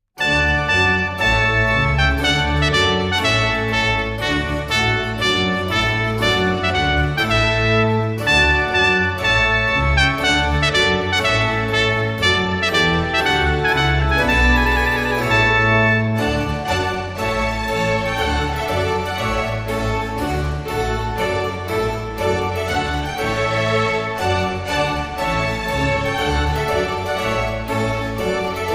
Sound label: Trumpet Tune Short